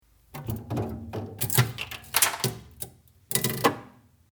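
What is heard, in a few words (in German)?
Kühlschrank Eisfach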